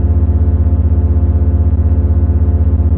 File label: gate, VIDEO GAMES; pulsar